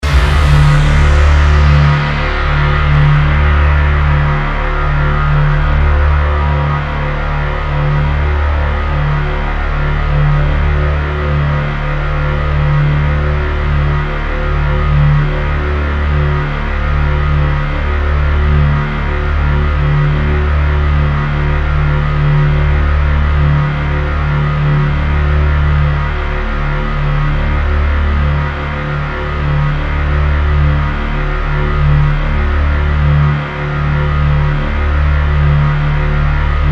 Drone Synth Stab, Drone, Synth, Stab, Long, Horror; 5.1; Sound Effects:Science Fiction; BlastWave